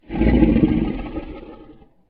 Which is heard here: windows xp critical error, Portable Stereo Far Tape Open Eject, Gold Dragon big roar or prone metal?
Gold Dragon big roar